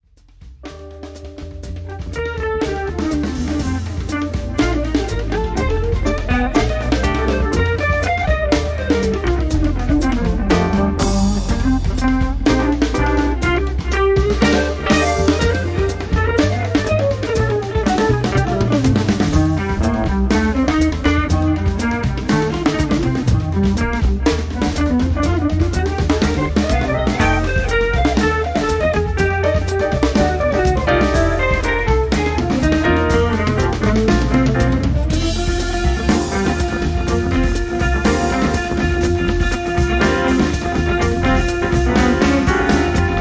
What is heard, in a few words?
brush stroke excerpt